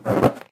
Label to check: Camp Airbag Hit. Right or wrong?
Right